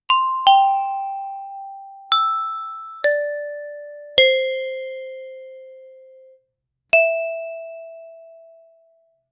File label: create a loud, music